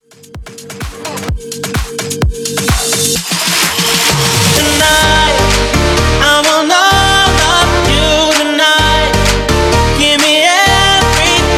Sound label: Future Bootleg 128 BPM